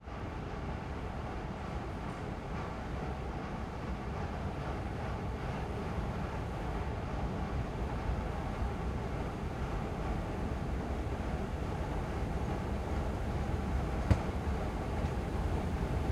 Escalator - Subway Station - Ride POV - Ride 2 Escalators; MS Decoded; Pole Position